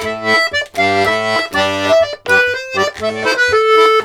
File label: Accordions, 120 laride